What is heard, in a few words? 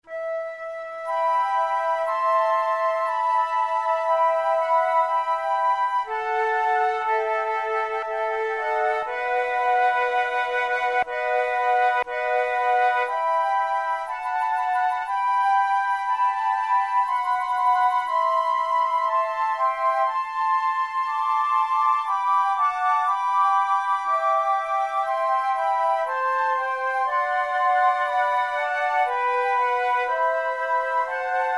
flute sonata adagio; mattheson